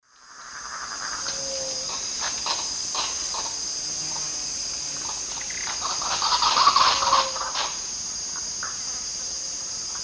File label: Crotophaga major, group calls; S. America; Eastern Lowlands